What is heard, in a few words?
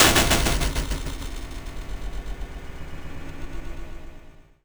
Machinegunner; Zero-G; Soundclash Nu Dance Electro; Acidized Wavs; FX Atmospheres